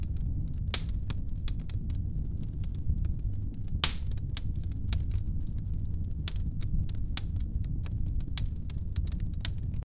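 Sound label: crackling fireplace indoor, popping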